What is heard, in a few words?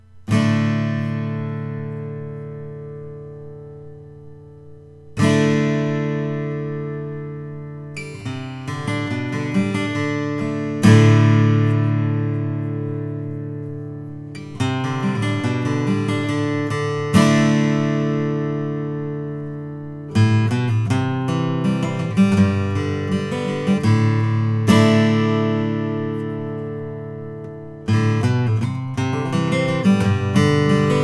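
olson sj cedar and koa natural; james olson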